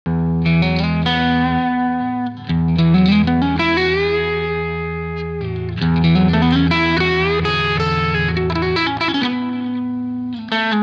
Tele dirty

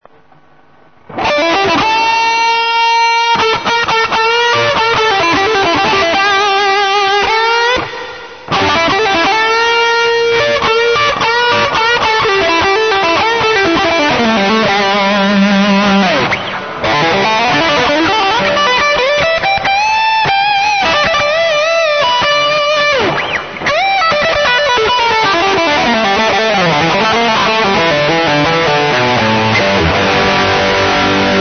HB Bridge overdrive, guitar pickups

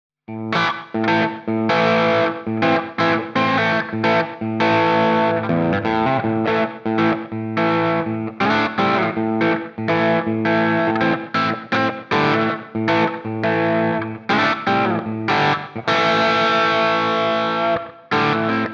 Tele Bridge thin dirty

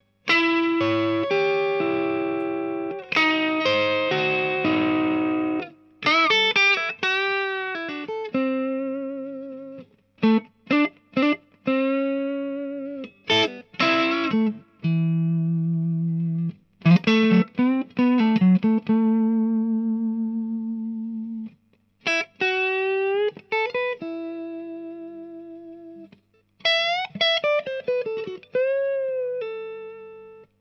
Tele Neck Pickup

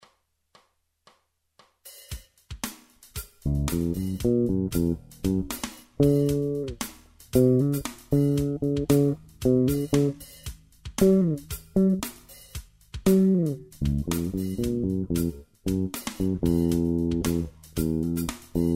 Jazz Bass with Drums Treble Down

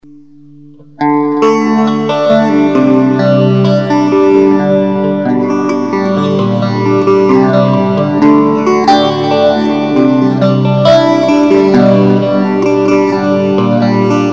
Platinum Phase Neck, guitar pickups

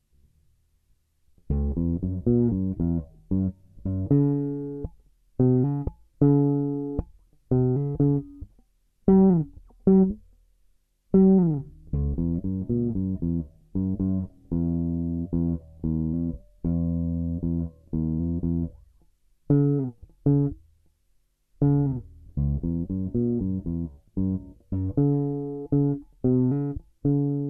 Jazz Bass without Drums Treble Down

